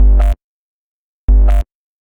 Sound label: Boss Attack Left